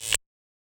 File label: Yamaha DJX 2B, Perc F3